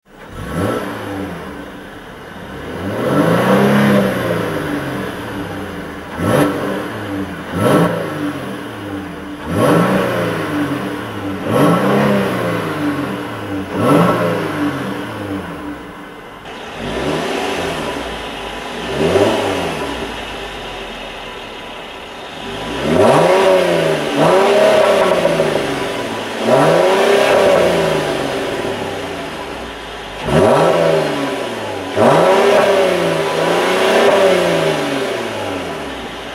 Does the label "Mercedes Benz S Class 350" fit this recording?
Yes